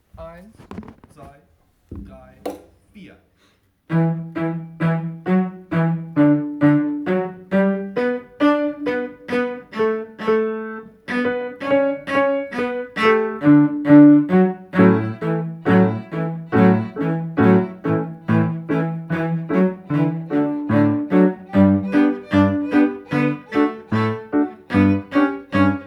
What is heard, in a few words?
Can Can Cello